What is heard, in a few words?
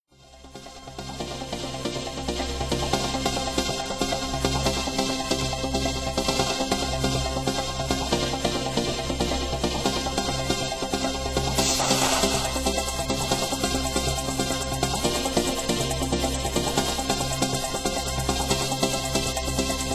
Instrumental